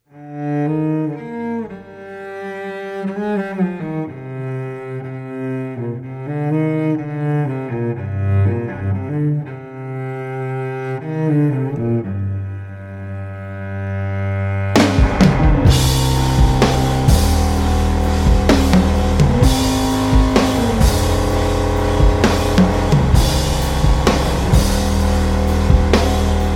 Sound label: Internal Melody